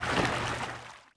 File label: swim